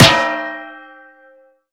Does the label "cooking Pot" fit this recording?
Yes